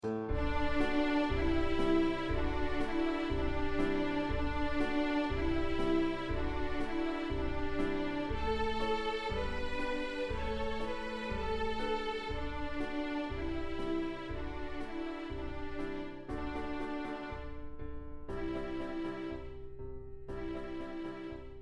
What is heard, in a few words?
rockin along